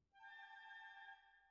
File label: Cbasses